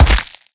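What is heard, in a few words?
Stalker Movement Synced Melee Facepunch Punch; Titanfall 2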